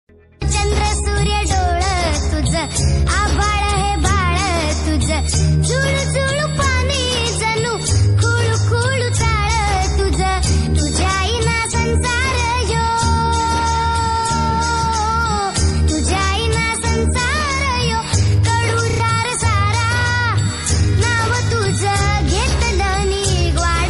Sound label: Marathi ringtone